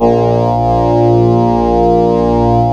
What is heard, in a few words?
ORGAN, A#; Big Fish Audio; Synclav FM